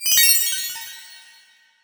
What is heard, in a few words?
spell